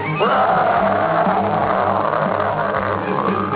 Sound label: hulk growl